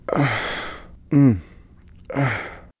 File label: eat cake male